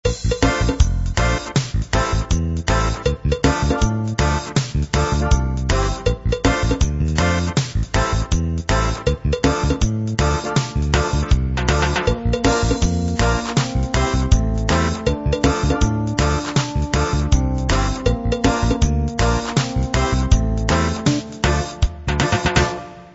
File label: reggae